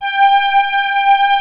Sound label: OB, OB modular; Greytsounds VOL-1